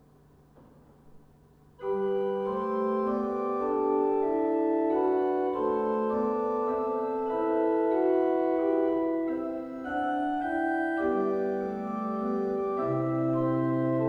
Andante, orgel; Zollner

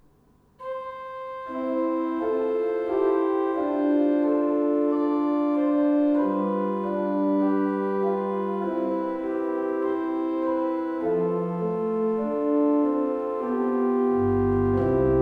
Con Moto, orgels; Vogler

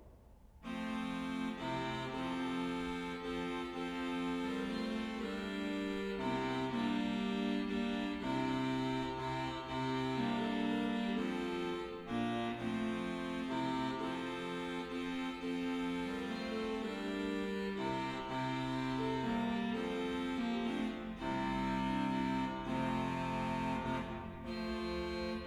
Saltarelle, orgels; Hoogeveen; Oosterkerk; Susato

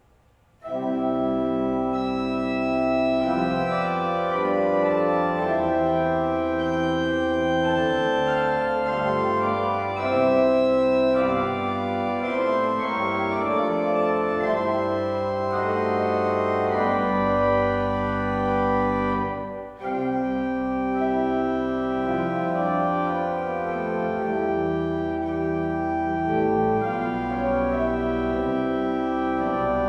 praeludium, orgels; Bovensmilde; Brosig; opus52

